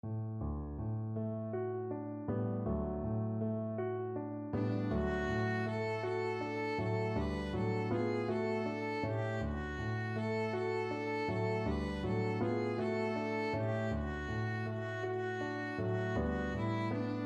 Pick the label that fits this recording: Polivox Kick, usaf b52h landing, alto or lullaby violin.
lullaby violin